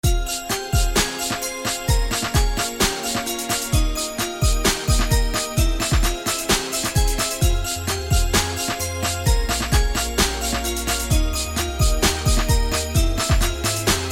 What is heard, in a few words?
Iphone Hot Diamonds new